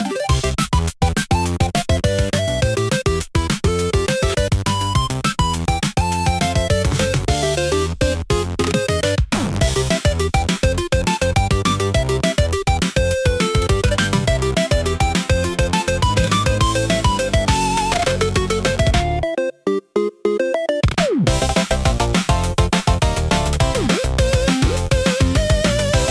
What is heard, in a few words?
Coin Drop Run, retro game chip tune; pico pico sound